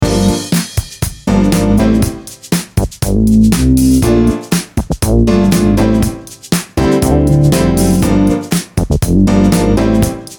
Funk

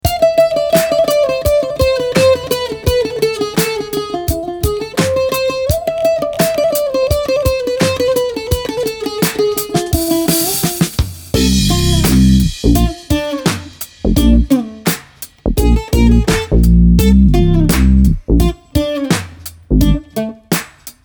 Ethnic World

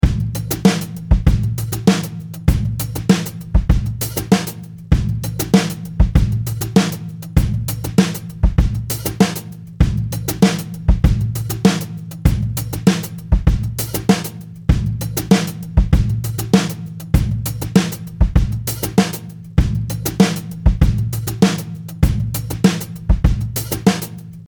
Cuban Soul

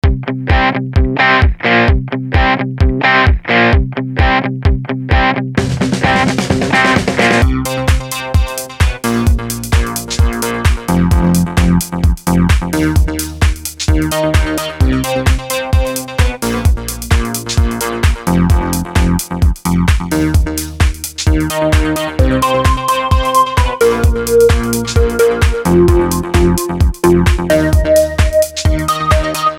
Blues

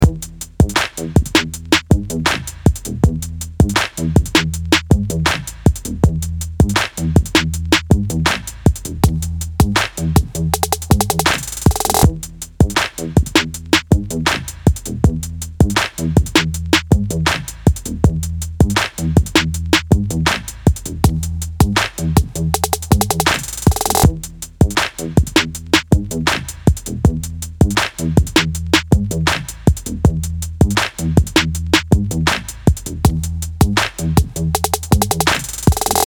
Desert Hip Rock

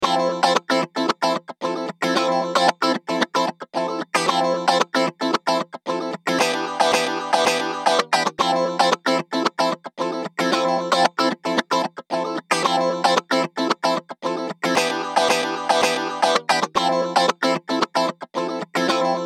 Dreamy groove

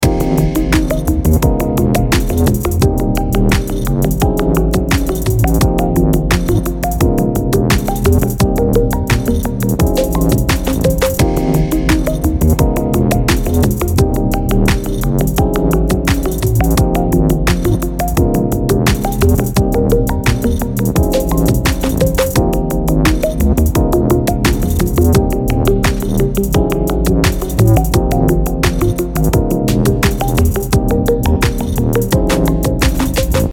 Jazz Dubstep

